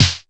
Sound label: Snaredrum, Korg T3